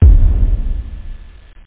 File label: TR909 BAS; Groovy Bytes: Behind the Moon